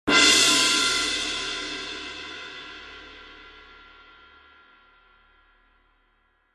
K Constantinople Suspended, cymbal

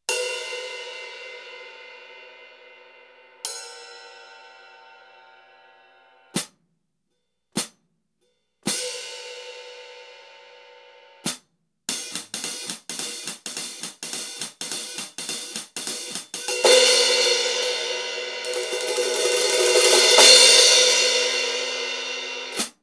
16 Sweet HiHats

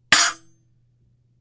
S China Splash Choke Crash, cymbal sound

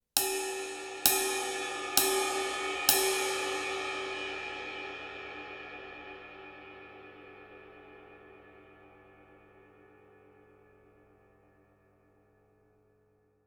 K Projection Ride Bow, cymbal sound; 21" Kプロジェクション・ライド; 力強く切れのあるベル; クレイグ・レイノルズとのコラボレーションでデザインされた21" Kプロジェクション・ライドは、力強く切れのあるベルと、パワフルでクラッシュ可能なボウを両立。

